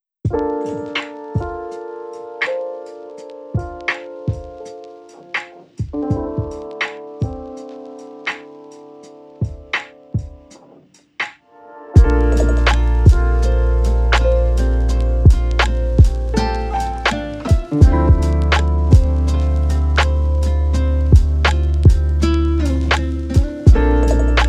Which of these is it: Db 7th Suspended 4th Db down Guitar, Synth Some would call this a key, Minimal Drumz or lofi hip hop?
lofi hip hop